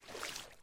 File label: footstep water